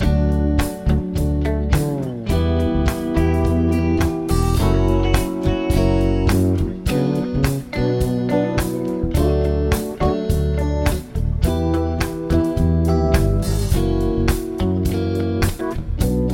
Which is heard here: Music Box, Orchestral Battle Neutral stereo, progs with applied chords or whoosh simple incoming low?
progs with applied chords